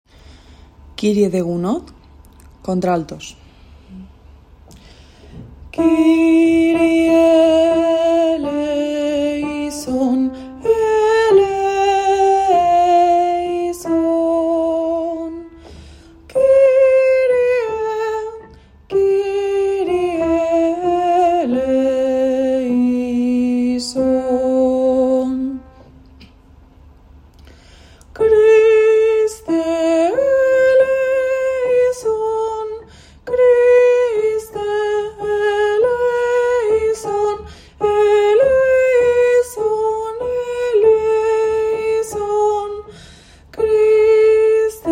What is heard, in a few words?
kyrie gounod alt